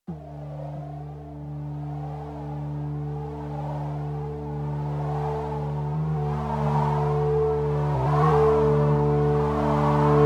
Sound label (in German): Akai EWI4000s Werkspresets